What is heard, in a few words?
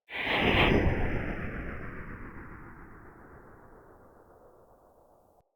Soul Plane random, ambience